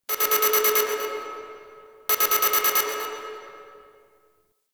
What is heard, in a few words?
Dojo Tech Beep; Ambience; Warframe; Mod Quest; Teshin's Hideout